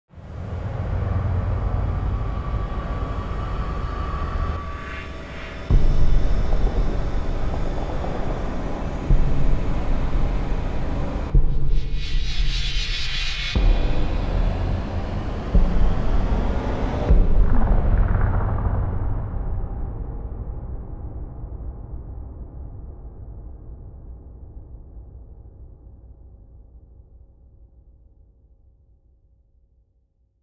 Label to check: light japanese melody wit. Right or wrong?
Wrong